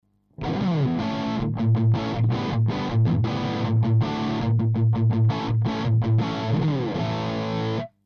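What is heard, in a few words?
rhythm Position KSM313 back, guitar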